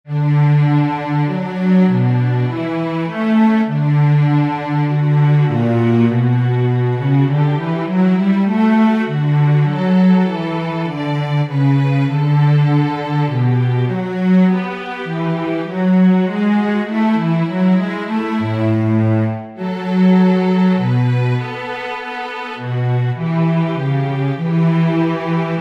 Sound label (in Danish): bas; Dejlig er jorden